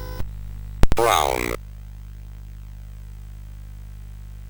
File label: sid CSG8580R5